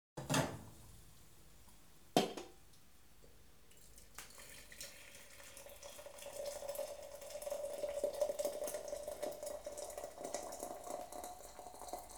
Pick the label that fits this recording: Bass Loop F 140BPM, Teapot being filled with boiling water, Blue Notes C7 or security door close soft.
Teapot being filled with boiling water